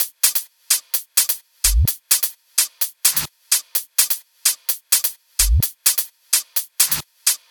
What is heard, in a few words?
128BPM Drums; Vengeance Samples; Verve Kit